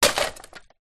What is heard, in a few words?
impacts wood ukulele thin smash break